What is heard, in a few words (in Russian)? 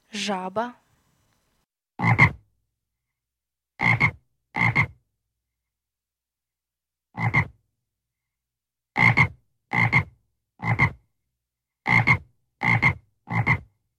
Жаба